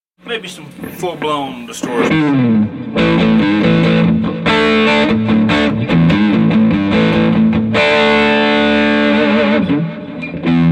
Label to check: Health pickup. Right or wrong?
Wrong